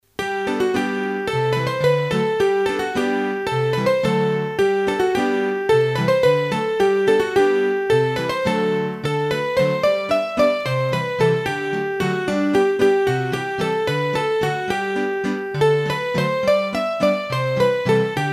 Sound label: Scottische Valse, hurdy gurdy; Massif Central